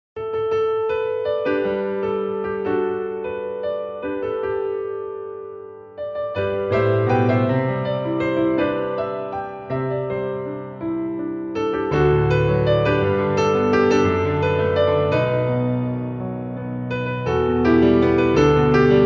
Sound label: piano